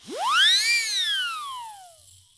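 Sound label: WHISTLE, LATIN PERCUSSION; Best Service Advanced Media Trax Modern Composer; LATIN PC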